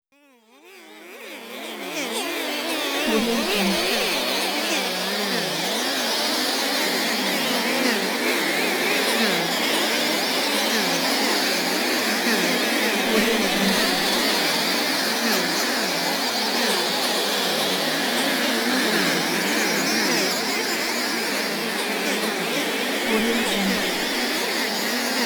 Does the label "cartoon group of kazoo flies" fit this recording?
Yes